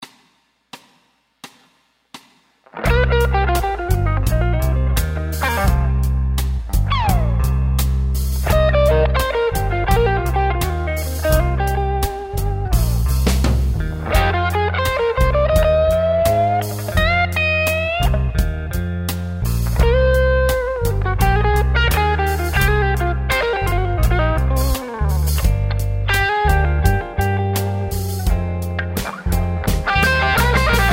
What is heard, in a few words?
Solo